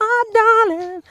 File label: SUNG; Voice